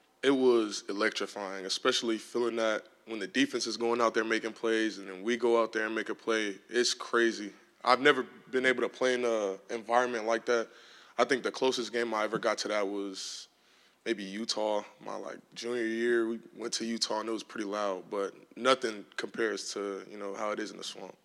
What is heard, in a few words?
Noise in Swamp; Gator football